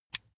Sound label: pull out usb cable